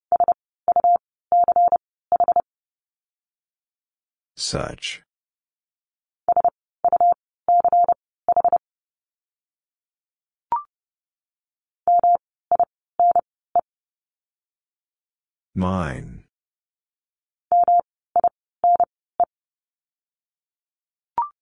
Letter m - Words 30wpm, morsecode

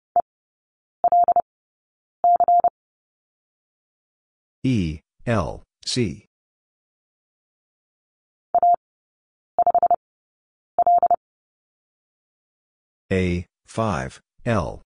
Letter c - Three Characters 30wpm; Lesson 16e

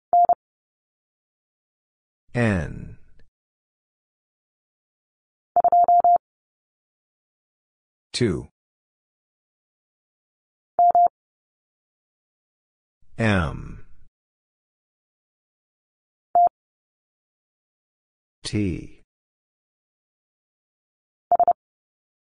Letter m Single Character 30wpm, morsecode; Lesson 18c

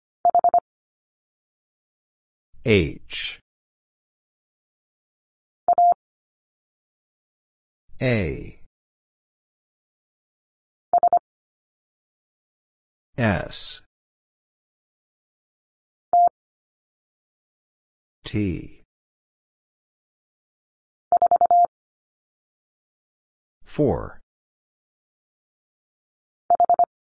Letter h Single Character 25wpm, morsecode; Lesson 11c

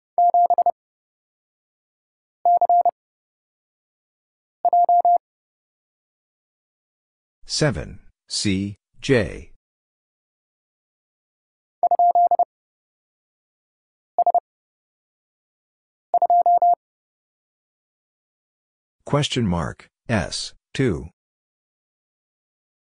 Letter x - Three Characters 30wpm, morsecode

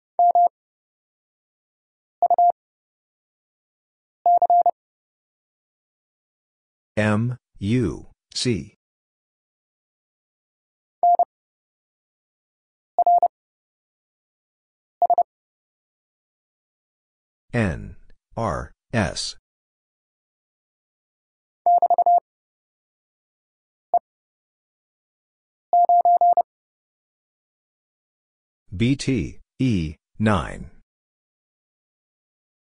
BT Three Characters 30wpm; Lesson 36e

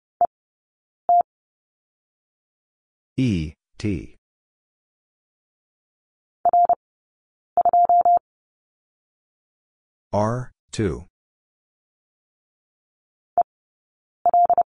Letter m Two Characters 30wpm; Lesson 18d

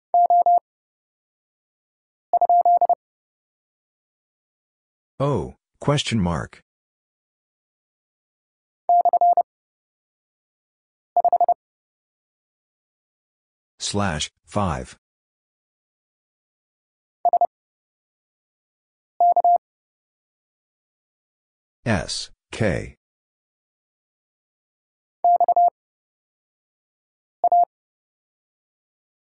Letter q Two Characters 30wpm; Lesson 38d

